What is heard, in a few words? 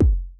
kick, Roland MC-909